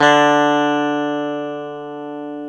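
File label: E 12 String guitar; Club-50 - Foundations Roland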